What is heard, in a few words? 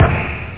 DZING, SNARE; Workbench Add-On - Volume 1